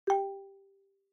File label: Xylophone Multi